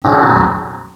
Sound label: "uuh", Echo inside caves, underground; DS; ML BOWSERS INSIDE